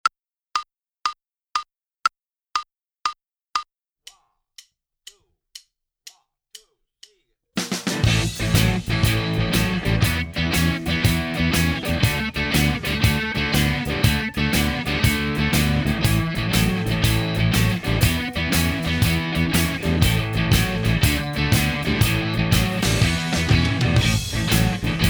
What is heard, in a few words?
Minus Keyboard; Inst Ensemble